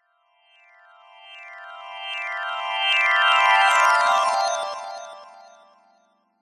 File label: fantasy magic glissando reversed bright twinkling